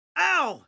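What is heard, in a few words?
female sick; nwnds audio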